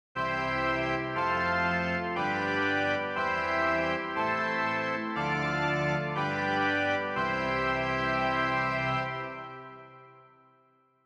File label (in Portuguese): cadencia expandida